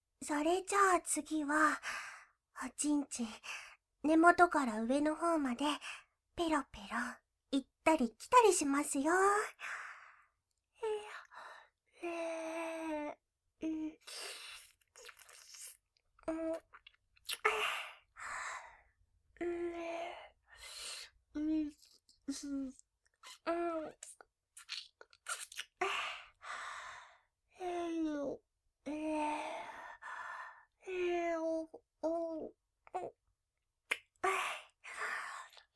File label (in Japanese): 竿舐め上げ; ダミーヘッドバイノーラル; VOICE LOVER